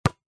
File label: sport basket ball hard bounce x1 on asphalt concrete